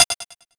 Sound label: Panel Pebbles; Finding Nemo; XBOX